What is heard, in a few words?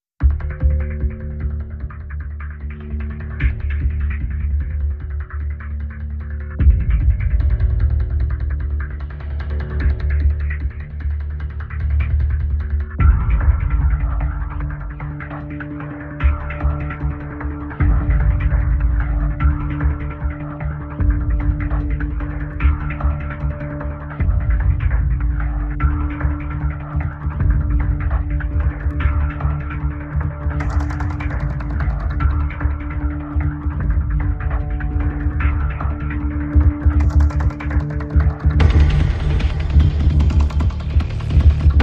Percussion and Pulse